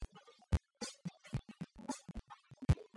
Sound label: Latino